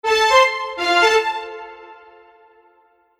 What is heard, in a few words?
strings